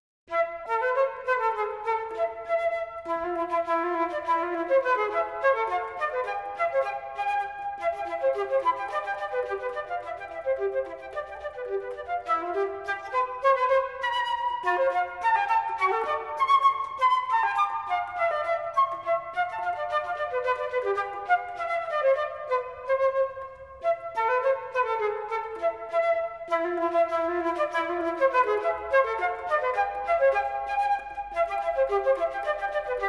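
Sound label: Sonata for Flute in A Minor, Bourrée anglaise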